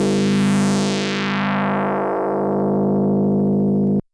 DX100 G3; rocket

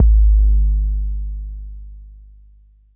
BD 808 Noise

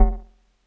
Drops F0

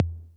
Tom Low 808 Color

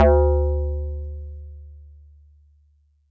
Metal Tube DX100 D3

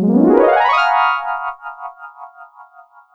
Synth, Waoooong Multi

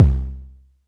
Tom Low

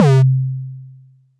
Tom C3

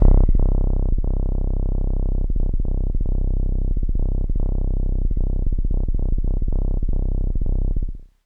Kawaii C1; keys

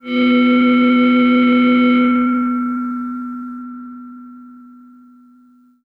Breath V DX100 B2

